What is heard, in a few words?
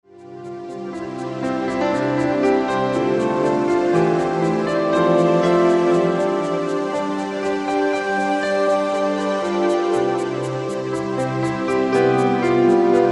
etherical piano